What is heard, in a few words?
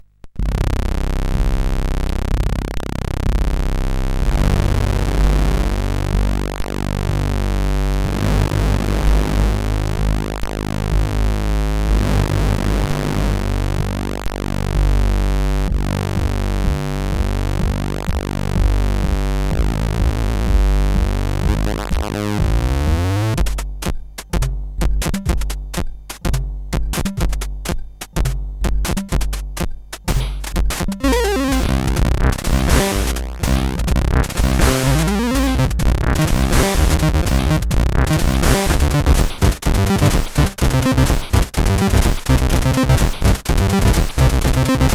Secret Engine, CSG8580R5